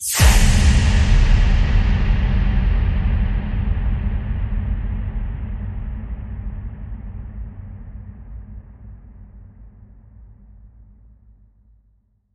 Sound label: Inhale Exhale Whisper Boom